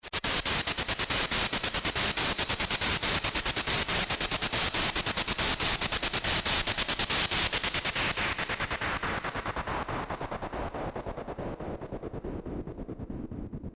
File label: Pink Noise Delayed; Techno